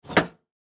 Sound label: Lawn Mower Brake, Press, Down; John, Deer; Lawn Mower Sound Effects